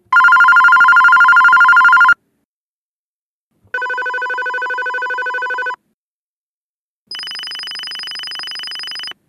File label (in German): innenstation 3 toene